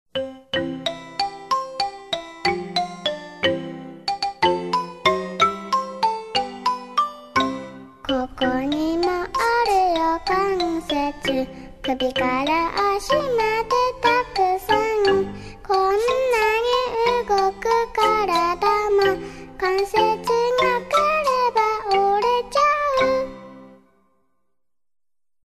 words and music, japanese; childrens songbook

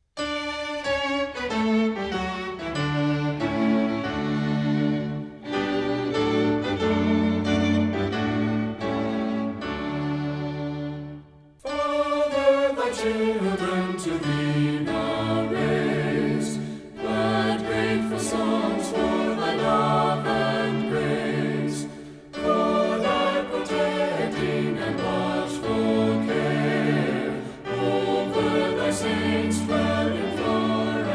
vocal and instrumental, hymns

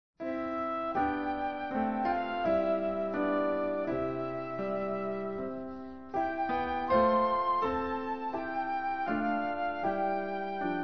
i know my father lives instrumental; hymns french

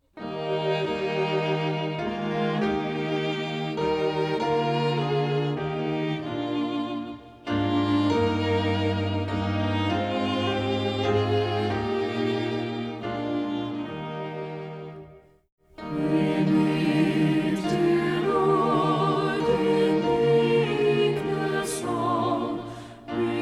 vocal and instrumental, hymns; we meet dear lord

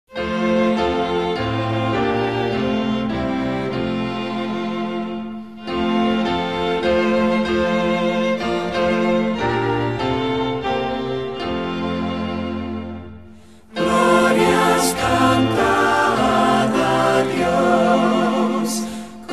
vocal and instrumental; hymns spanish

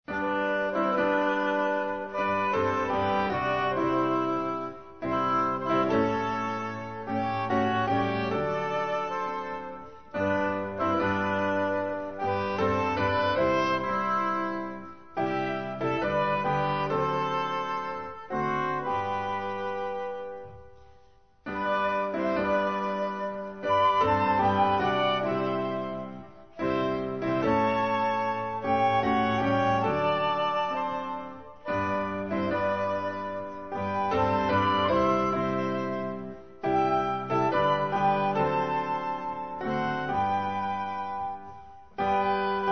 should you feel inclined to censure instrumental; hymns french

